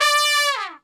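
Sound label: D3 trumpet, Brass Super Section; Best Service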